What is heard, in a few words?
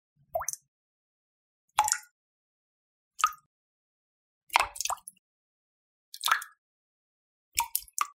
drop water